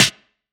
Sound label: DnB Drum Hit Snare; drumnbass 170bpm; Drum Kits; musicradar